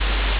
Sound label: ambience room tone